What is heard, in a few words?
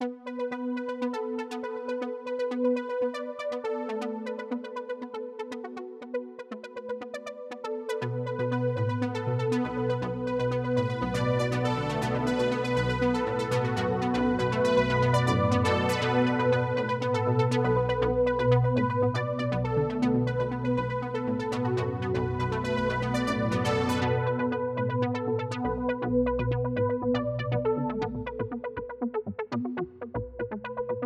pulsar lowpass evol, Clavia Nord Wave; arpeggiated sequence; low-frequency resonance